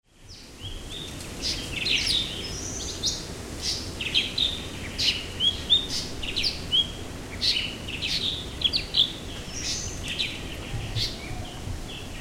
cucak ranti blue winged leafbird chloropsis cochinchinensis